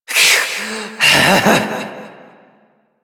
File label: Frustration; Warframe; Entrati; Evil Twin